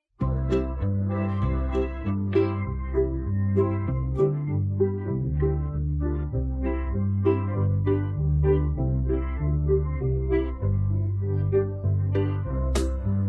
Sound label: Instrumental, Podkład muzyczny; Kòło; autor:inteligencja Sztuczna; piosenki dla dzieci